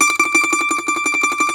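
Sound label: Mandolin tremolo, Plucked Strings; E-MU Producer Series Vol. 7 – Old World Instruments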